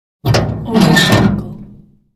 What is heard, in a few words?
stove oven door close; wistansound